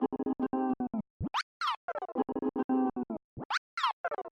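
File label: guitar loop arabic scale C 111bpm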